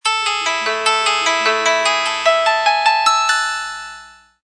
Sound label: Mirage Tone; Samsung SGH-i710